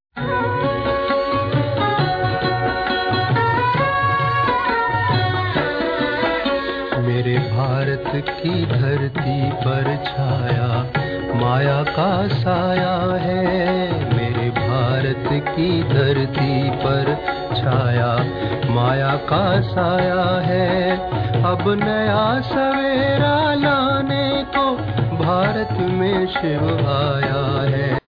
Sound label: Devotional